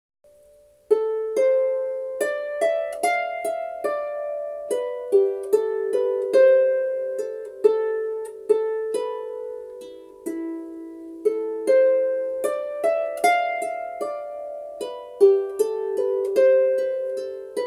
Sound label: Greensleeves Right Hand, harp